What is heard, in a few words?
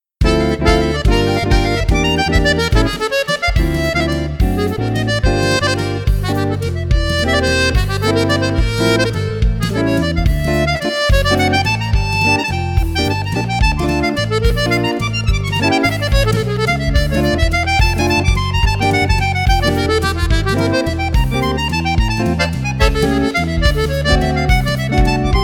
waltz; virtuosismi ruffolo